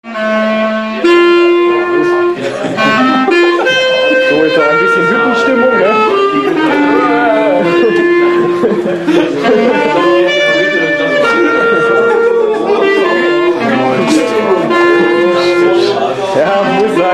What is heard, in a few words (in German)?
huettenstimmung; silvretta